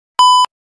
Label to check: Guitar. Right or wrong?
Wrong